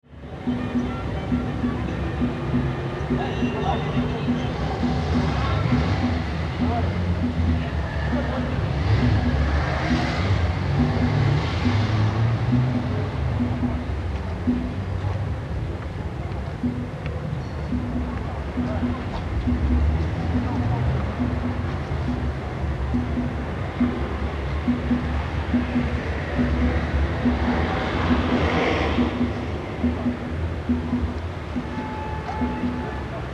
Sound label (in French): arts de la rue percussions